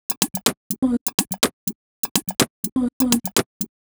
A# 124bpm, House Drumloops; Zero-G House Fabrik Drumloops

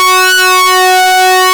SYNCSWEEP F#, ARP Synths; E-MU Formula 4000 Series Vol. 3; Analog Odyssey; ARP Synths II

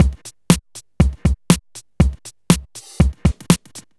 Drum Loops, 120 BPM; Sonic Foundry (Sony Creative Software); Underground UK House & Electro

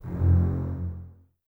Basses F 1, String Ensembles; Miroslav Vitous; LINKS ORCH

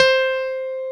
ELEPI C4, Piano; ELECTRIC PF#3

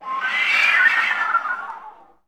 FL FX, Advanced Orchestra; Best Service; Peter Siedlaczek's Advanced Orchestra

